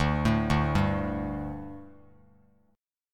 D Suspended 4th D, Bass Standard; arpeggiated

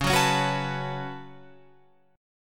Db 7th Suspended 4th Sharp 5th Db Guitar Open F; strummed

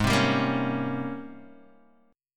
G sharp Major 11th G sharp, Guitar DADGAD

